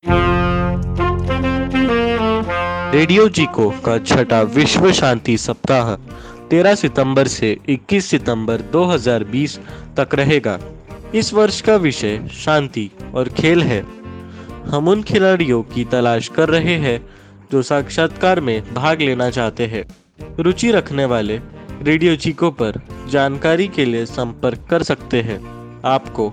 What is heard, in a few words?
jingle hindi; weltfriedenswoche 2020; RadioChico